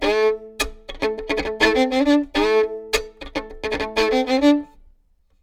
Violin Fiddle Lick With Percussive Bow Chop Monophonic